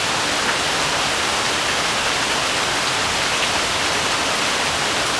Rain Rear

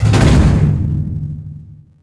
metal hit; Star Wars Obi-Wan; XBOX

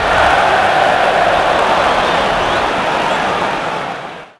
derision, Crowd; David Beckham Soccer; XBOX

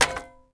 pipe hit creak; impacts explos

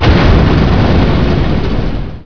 car exp; Chase Hollywood Stunt Driver; XBOX

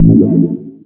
Pick Up Health; Characters; Ranger

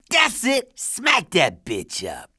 CHEER; TrueCrimeStreetsOfLA; XBOX

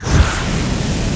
flame thrower start

